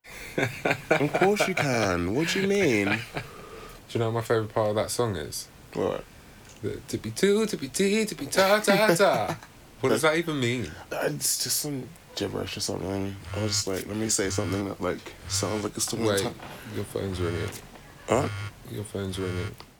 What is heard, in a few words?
gibberish